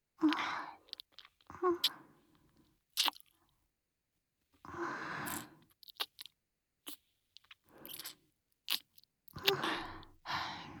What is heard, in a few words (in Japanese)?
キス 接吻